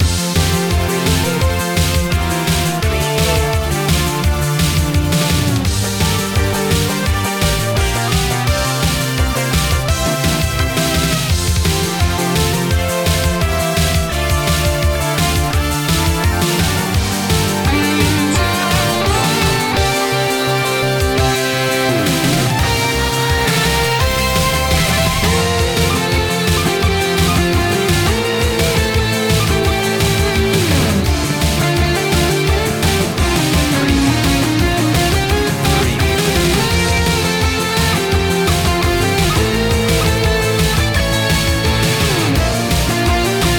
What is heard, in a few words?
j-pop victory